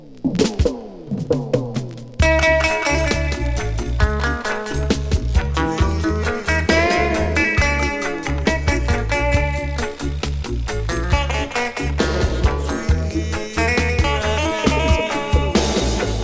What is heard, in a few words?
Sweet Reggae Music